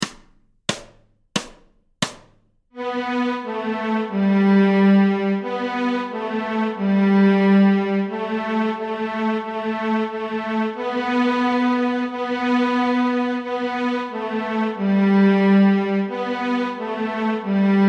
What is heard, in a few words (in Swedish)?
Stråk G, Fiol